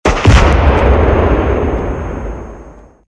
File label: explosion